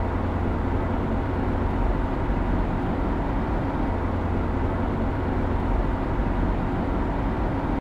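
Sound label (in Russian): беспрерывный гулкий шум едущего автомобиля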